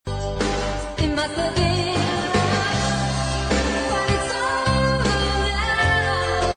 old people relaxing; audio meme

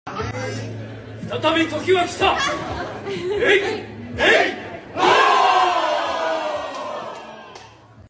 cast cheer; shogun; green screen; audio meme

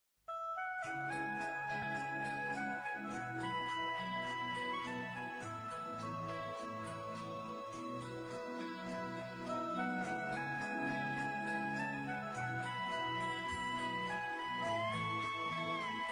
flute; titanic; audio meme

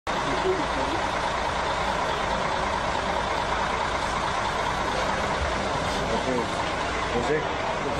crying; mourinho and materazzi; audio meme

